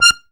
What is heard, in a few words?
ZUPAN STR, AKKORDEON; Best Service; Alpine Volksmusik